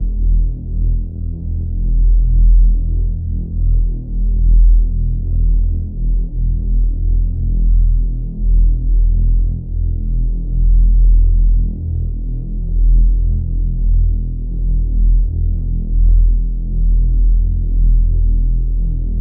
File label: Hold Harm Synth; Khezie 808s